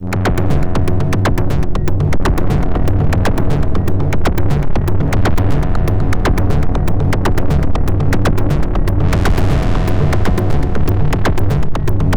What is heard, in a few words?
apocalyptic noise; fifth seal